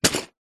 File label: office pencil case drop